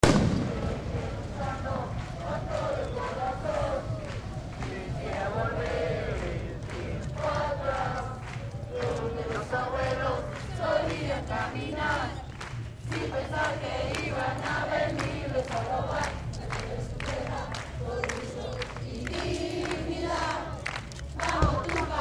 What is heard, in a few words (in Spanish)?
marchas nacional pueblos originarios; bv y av s martin